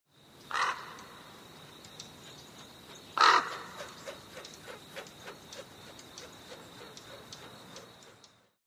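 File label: sound of crow flying past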